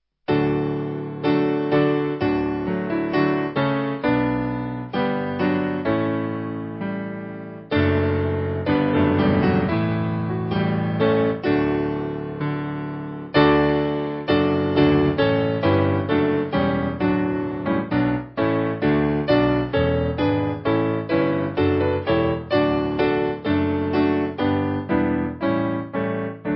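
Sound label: Piano, church music backing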